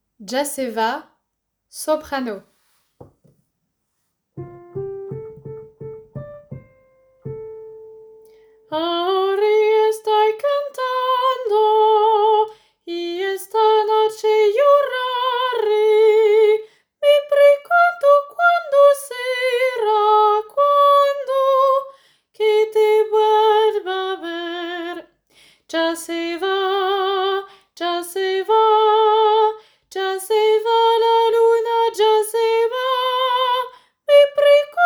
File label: Soprano